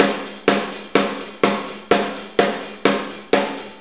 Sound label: Soul Deep, Drum Tools, Loops; Sonic Foundry (Sony Creative Software); Loops & Samples Drum Tools